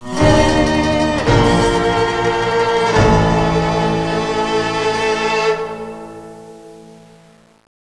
zombie win; umbrella

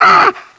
charger pain; umbrella

